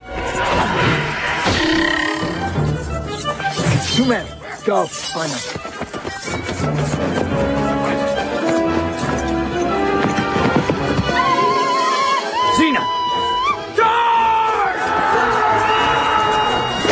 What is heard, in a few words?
battle